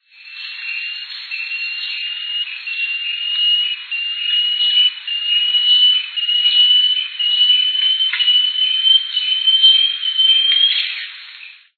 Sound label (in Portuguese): tovaquinha